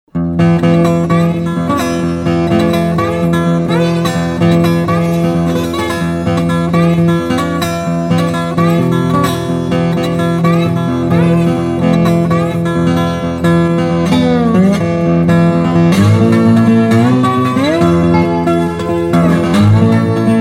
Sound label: Acoustic Slide